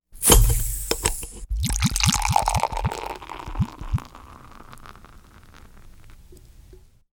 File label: food drink beer cap pop and beer poured; sound effects one